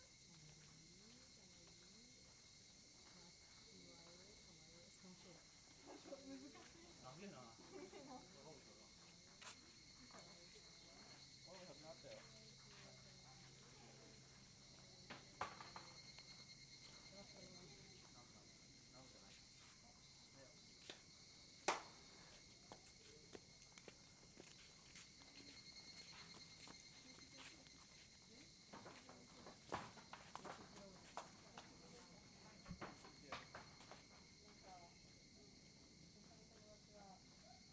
Silence

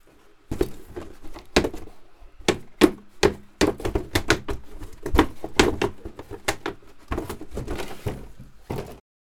Someone Struggling To Close A Box

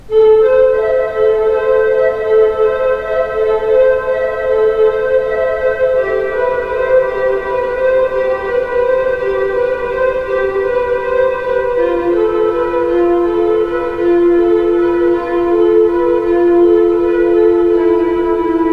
Nature Thoughtful Music

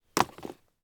Landing On The Ground

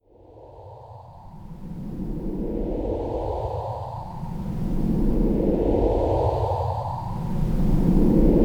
Blizzard

